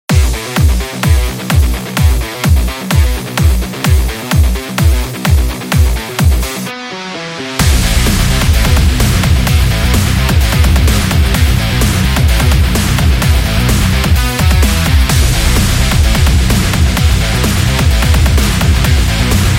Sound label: Rock Sports